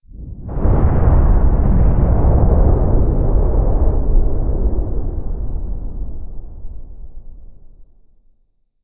structural explosion distant

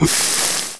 pl burn pain, player

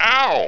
grunt pain; hgrunt2

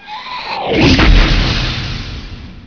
impact

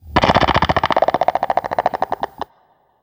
chat; gonarch

